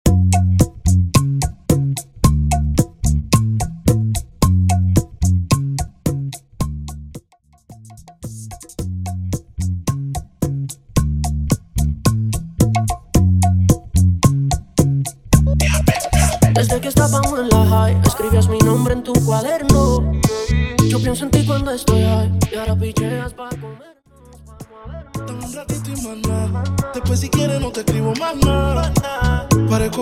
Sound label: Bachata Remix 110 BPM; bootleg bachata remix